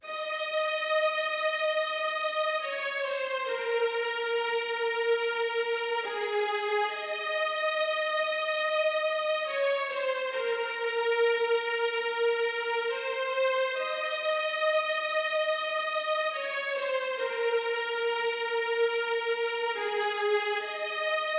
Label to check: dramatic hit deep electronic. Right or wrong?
Wrong